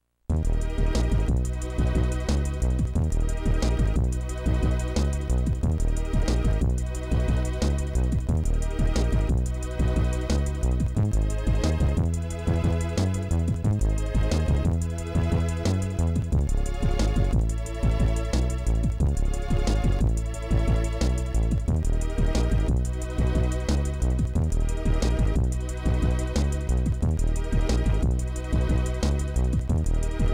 Boss Defeated; The Batman

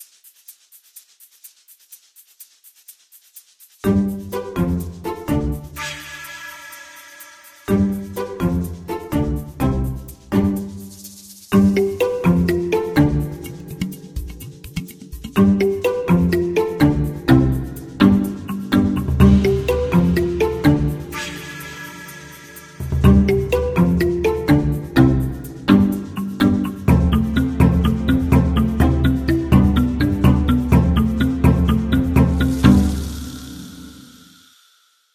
Sting; dino tales

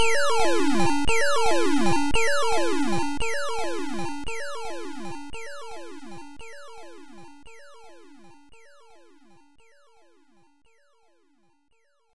Player Falls

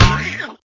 Tap ducky; Clickergame

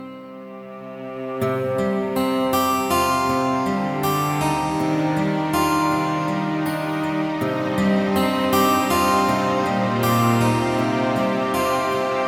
Music Fantasy Foreboding; Dungeon Punks; ps vita ps4 windows xbox one

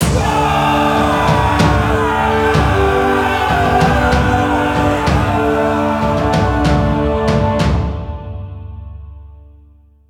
Mission Completed Battle; Cross of the Dutchman

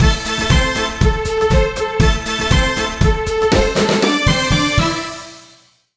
disco intro; Ringling Bros. and Barnum & Bailey; Wii

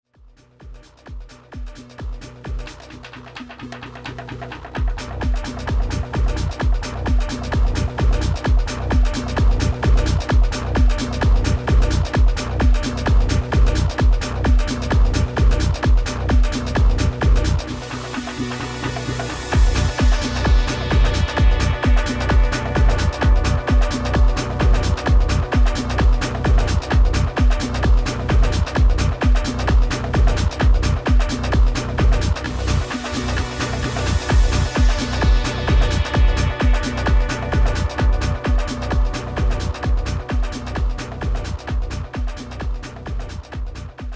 Techno

